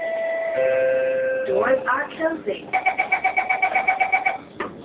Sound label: blips